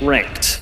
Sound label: "Ooff"; Memes